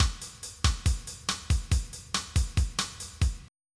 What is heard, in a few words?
bossa nova; FL Studio